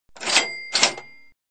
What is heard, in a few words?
Cash Register Sound